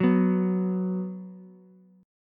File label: B 5th B down Ukulele; B5 strummed; chord shape; JGuitar